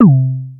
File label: Glass Down; Timecrest Music